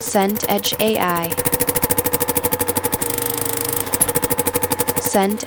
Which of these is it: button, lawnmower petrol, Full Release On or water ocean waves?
lawnmower petrol